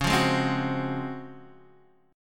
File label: C sharp Major 11th C sharp, Guitar Half Step Down